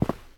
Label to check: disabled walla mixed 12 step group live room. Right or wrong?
Wrong